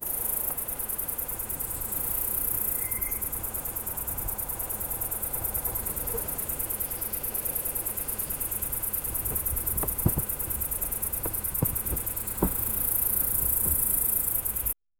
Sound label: ukrainian village in the, landscape